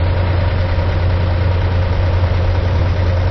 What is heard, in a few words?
Fast American Idle; 暴力赛车